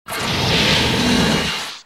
cardassian door

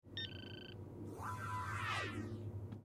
sickbay replicator

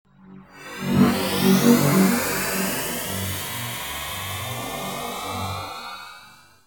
klingon transporter; Klingon Transporter 2

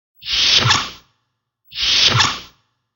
swoosh; TOS

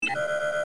input failed clean, computer

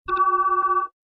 alert, computer

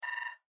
pause log, computer

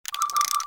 tricorder

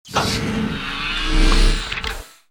door

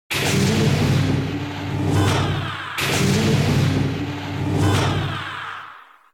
cargo bay; TNG

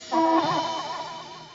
alien materialization; tos

